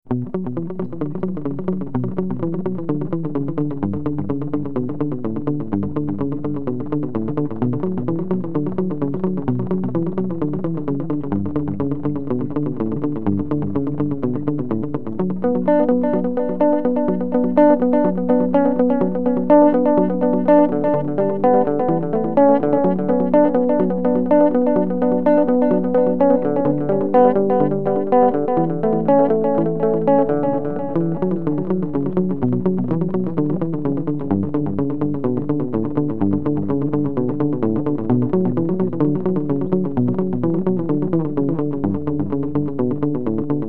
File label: delay